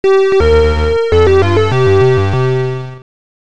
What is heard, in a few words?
dun duduuuun dududududuuuuun